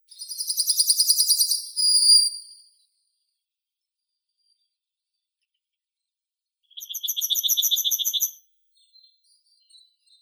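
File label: white-capped bunting, Emberiza leucocephala; ovsyanka beloshapochnaya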